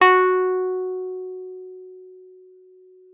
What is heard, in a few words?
WUFF F#4, WURLITZER; Xsample VOL-1